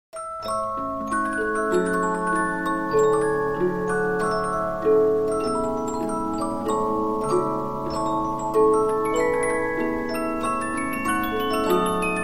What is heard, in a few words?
Polyphon, 15inch disc musicbox